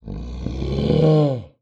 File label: Animal Mammal Carnivore Grizzly Bear Roar; animals; AdobeCC